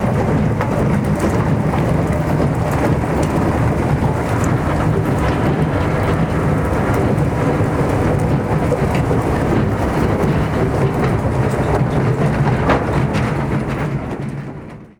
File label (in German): Hexler